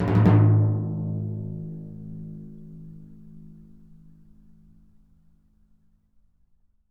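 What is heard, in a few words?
timpani A#, Synclavier Percussion; ILIO; Synclavier Percussion 2; World & Orchestral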